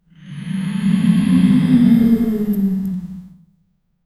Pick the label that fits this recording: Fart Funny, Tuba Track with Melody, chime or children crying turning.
children crying turning